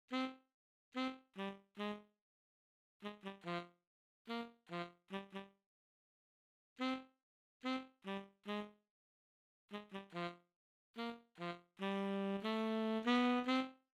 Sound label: tenor sax